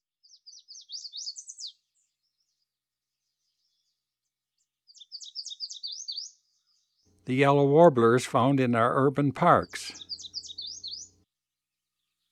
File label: Yellow Warbler; Eastern North America; Dan Gibson; Wildlife Identification By Sound